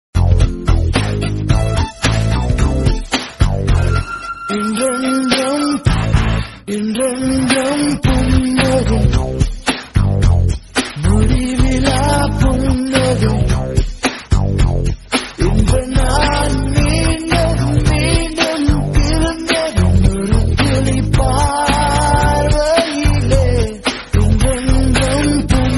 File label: Tamil song; alaipayuthey